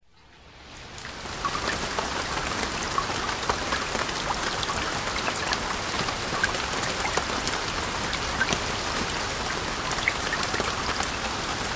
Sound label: Ambient Rainfall; Orchestrion